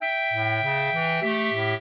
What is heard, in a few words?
clarinet; Mozart